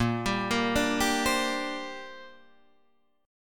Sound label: A sharp 6th Add 9th, Guitar Open D